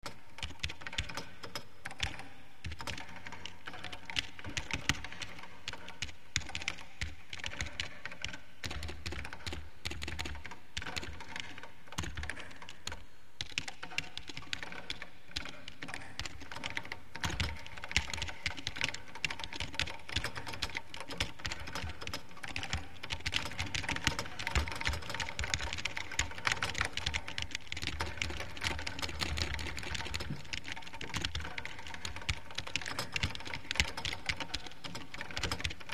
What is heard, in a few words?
manic ofice typing mainly